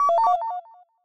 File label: delete